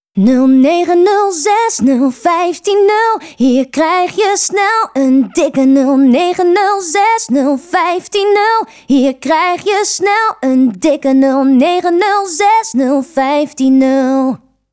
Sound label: Jingle